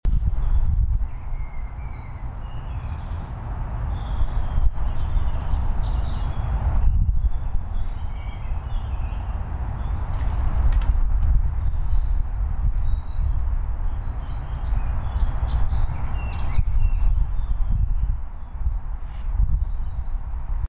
Aussenaufnahme, Olympus LS-P2

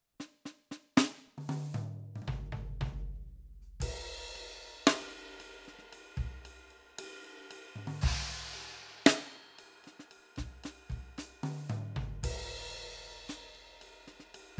YAMAHA DTX8K M Little Kit Dynamik

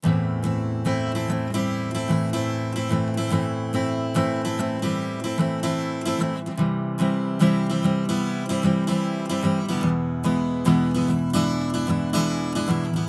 Gitarre EQ Solid State; Gyraf Audio G14-S

